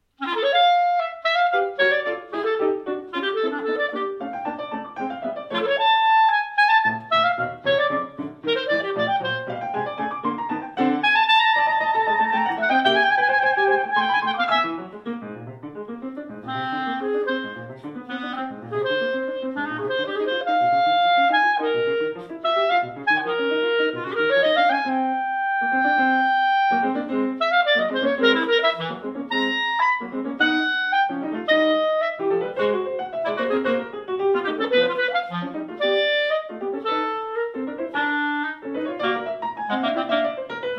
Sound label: Clarinet Sonata; clarinet, piano; First Edition